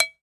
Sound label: Perc